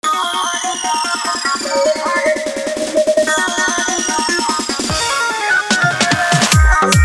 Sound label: Remix Song